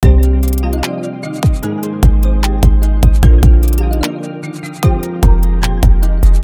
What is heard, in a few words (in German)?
beat mit rnb 808 auf 150 bpm; Der ultimative 808 Producing Guide